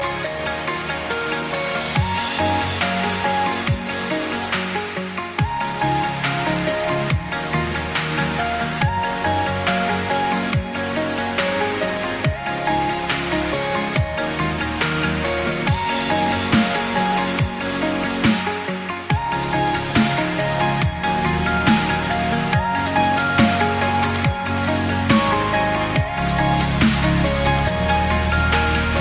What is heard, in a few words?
melodic dubstep